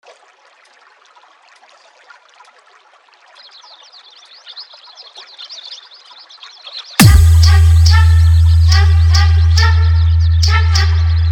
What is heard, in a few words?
Hindi Old is Gold Hard Dhollki Bass Mix